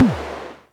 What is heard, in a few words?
Music Percussion Computer Tom, Drum Machines